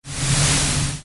gas

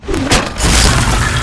enemy quick attack

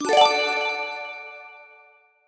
positive win; pond5

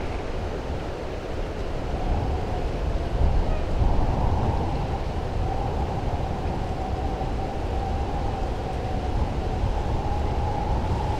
winter ambient

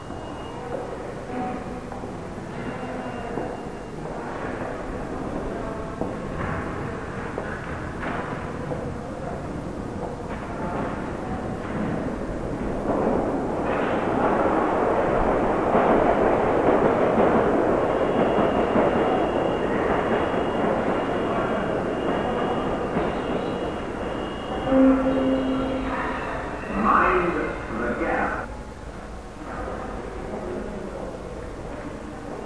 Station Ambient; bodilies

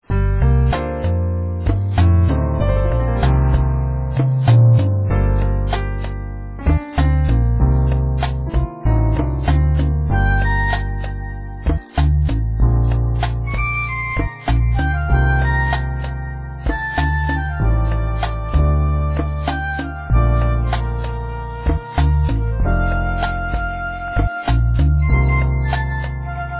music; Flashpoint; shockwave; tangled-gardens

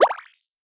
drop catch; CrossCode

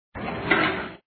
Door; coolmathgames

